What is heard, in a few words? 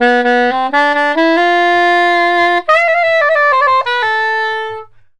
SAX